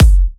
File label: Electro Kicks